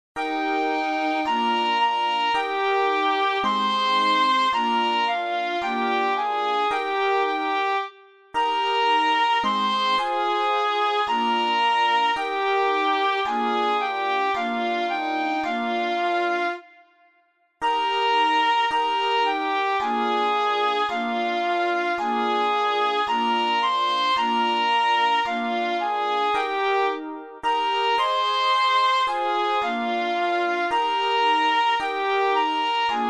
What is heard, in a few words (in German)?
oh herr mein gott keyboard